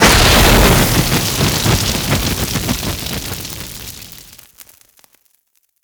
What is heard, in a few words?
Flame Burst; Warframe